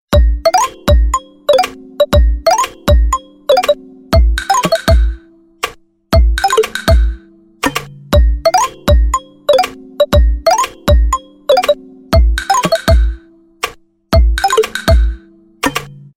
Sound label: nokia brikabrak